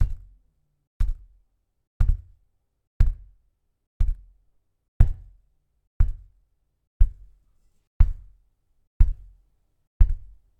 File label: thump putting metal bowl on soft surface; Impacts; bowlerbsland